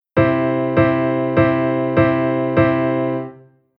Chord Harmony Rhythm

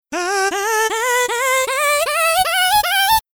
Future Bass Vo Chop Scale; Melo

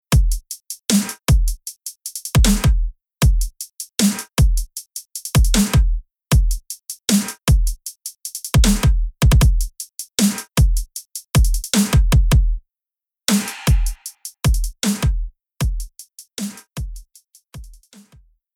Future Bass Drums

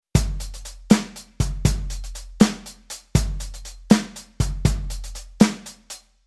Rhythm 12 8

